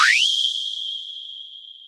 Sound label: anime shine sound